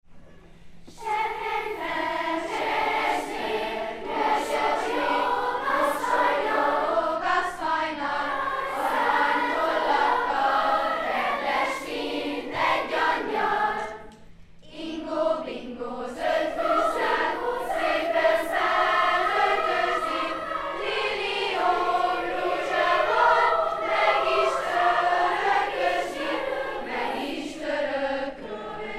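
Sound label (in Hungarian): ifjúsági iskola összevont kórusa; eneklo ifjusag 2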